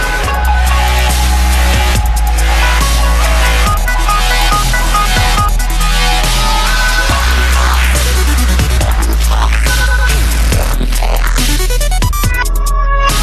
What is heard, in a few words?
Chinese dreams dubstep